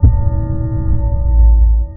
sonar Ping Suit Medium Beacon Directional; Barotrauma; Real Sonar

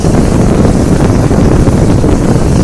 Plasma Torcher; Tools; Barotrauma; Barotraumatic

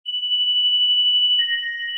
sonar Ping Water Far Beacon; Barotrauma; Real Sonar

